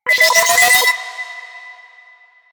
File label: grenade warning; Enemies; Soldiers; Weapons; Warframe; Lasrian; Goonadier